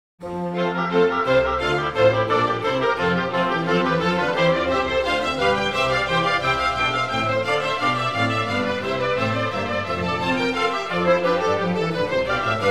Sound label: Concerto in F Major, Allegro; Concerto No 1; BWV1046; Bach; Bach The Complete Brandenburg Concertos